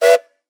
Steam Whistle; Blastwave FX

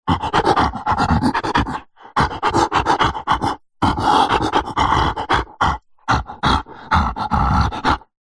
horror yeti monster grunt breathy playful; zapsplat

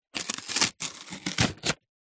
cardboard box small glossy skin rip; foley

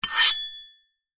metal scrape; foley

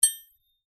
impact metal thin stick hit; zapsplat

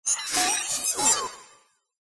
science fiction robot malfunction glitch

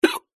human male single hiccup

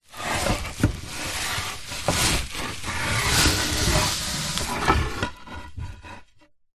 foley wood slats in plastic bag slide movement

